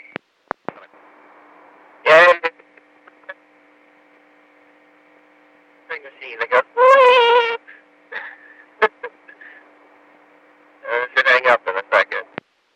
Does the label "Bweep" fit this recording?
Yes